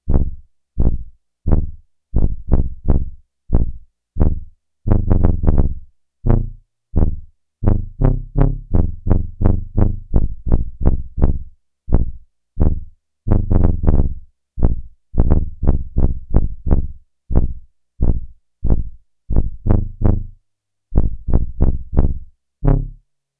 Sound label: TUBA, Roland SH-101; Factory Patches